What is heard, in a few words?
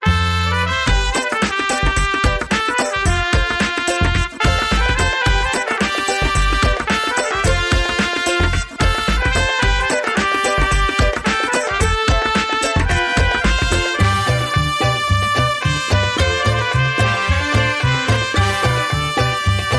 Mariachi Funk